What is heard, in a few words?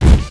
dog footstep, npc; Source Engine; video game